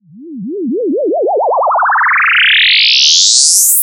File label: Start Up, Dance Electro; Рубрика: Dance Electro